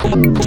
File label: Synth Shots; Vengeance Samples